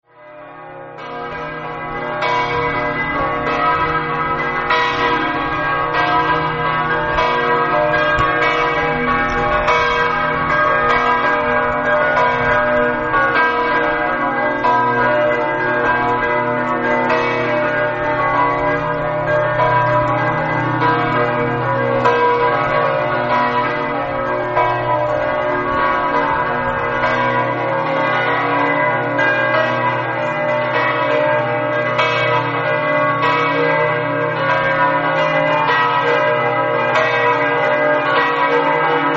gruagno, campane

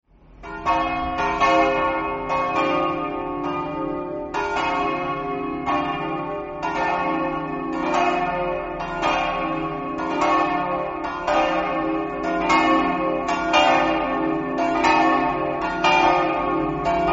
povoletto, campane

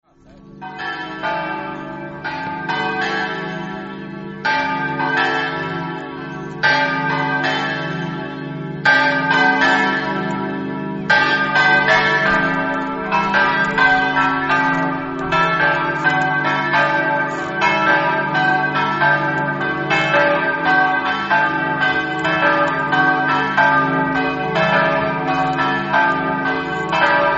sanzuan, campane

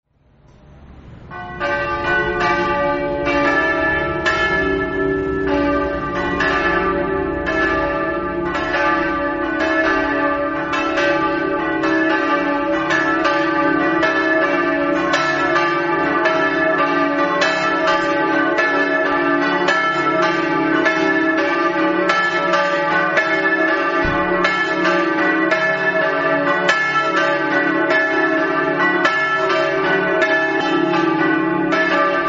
mereto, campane

